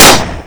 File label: glock 18